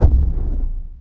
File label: Foot, Aliens